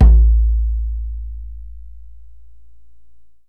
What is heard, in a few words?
DJEMBE 1 DUM